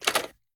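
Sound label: gear rattle weapon large; viper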